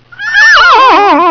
laser laugh

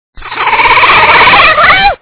stut slide

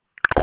stoof

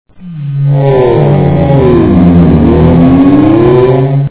lowdown

